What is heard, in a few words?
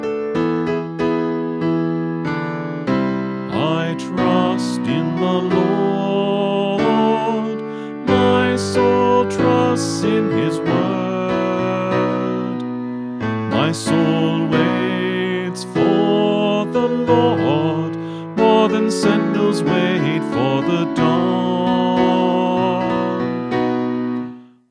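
Psalm, Choir Practice Tracks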